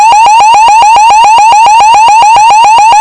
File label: tone